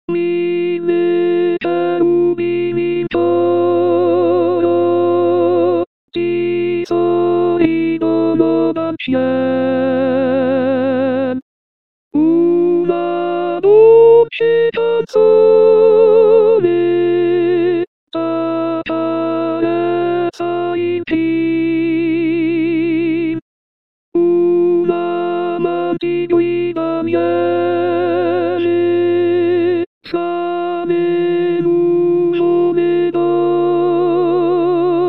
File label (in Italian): MILLE CHERUBINI IN CORO